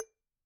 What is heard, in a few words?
Bottle Struck